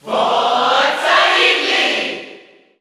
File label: Cheer Italian; Ridley; SSBU